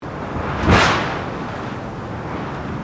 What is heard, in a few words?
Plastic giant sheet strong wind movement, Giant plastic sheets blowing in the wind